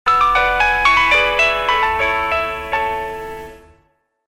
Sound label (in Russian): vintazhnaya melodiya na zvonok telefon nokia